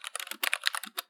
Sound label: keyboard typing